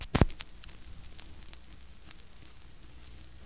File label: crackles, Vinyl